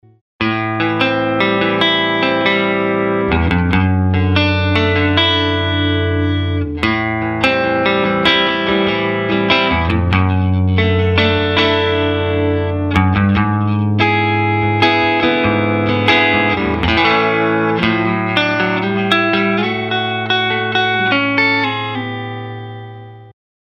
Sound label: clean